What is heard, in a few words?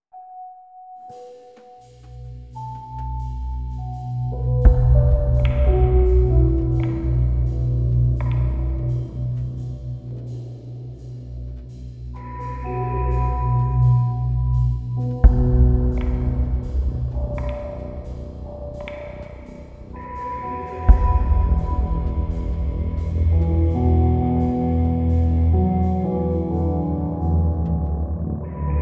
Airport Drums Keys; Hitman GO Definitive Edition; PS Vita; HitmanGoDLC